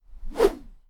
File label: Bamboo Swing